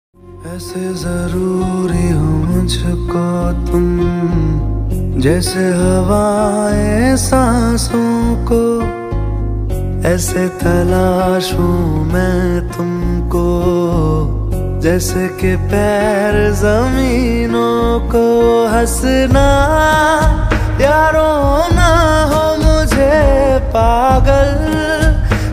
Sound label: Love Ringtone Hindi Ringtone